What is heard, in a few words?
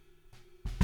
SNR Bot